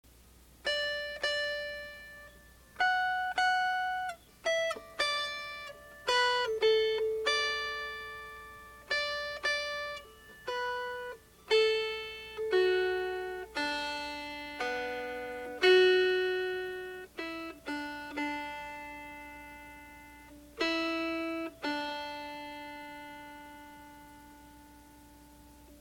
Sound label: Melody